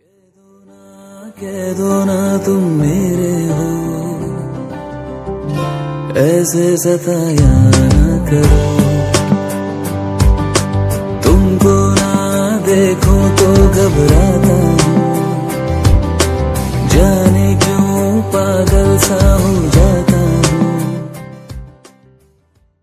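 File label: bollywood girl remixed ringtone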